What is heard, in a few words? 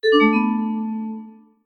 Bet Down; Mirror Magic; genesis